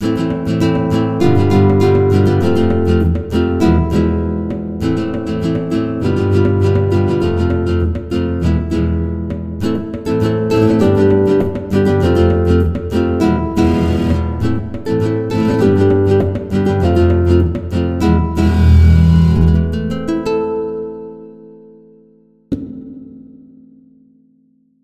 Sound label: Flamenco